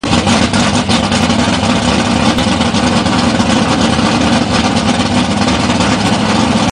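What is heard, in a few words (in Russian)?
звук сломанного двигателя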